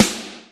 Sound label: penn, snares real